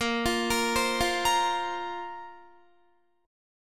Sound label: Bb Suspended 2nd Bb down Guitar; Bbsus2 arpeggiated